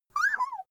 child laugh fake high

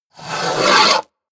sandpaper grind

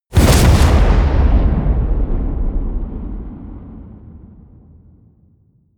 explosion

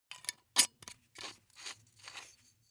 glass bottle close aluminium lid

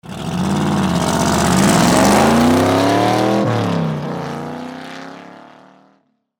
cobra 427rs passing quickly

